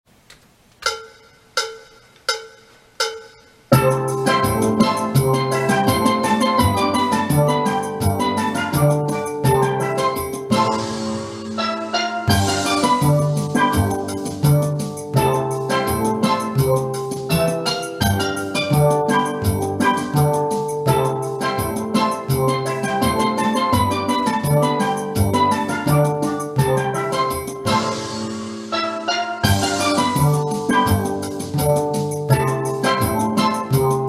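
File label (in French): BASSE PHRASES TUTTI, Steel Band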